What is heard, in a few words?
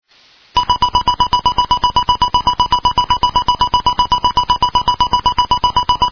Reversing Bleeper